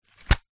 foley notepad small close; zapsplat

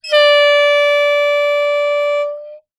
sport air horn 1x blast; zapsplat

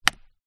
impact stick hit tree trunck

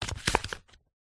household pamphlet glossy thick pages open; zapsplat

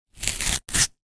banana skin peel; zapsplat

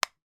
household eye shadow container click shut

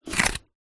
foley cardboard thin strip pick up from table

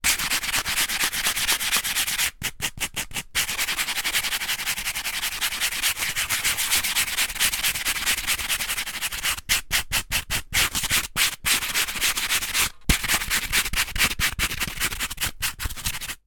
industrial sanding block rubbing brick

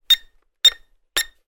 leisure drone rc controller on beep; syma x5sw

